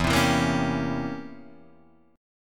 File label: C 7th Flat 9th E